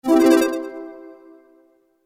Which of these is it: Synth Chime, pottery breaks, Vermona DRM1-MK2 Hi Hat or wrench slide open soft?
Synth Chime